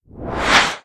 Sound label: circle flame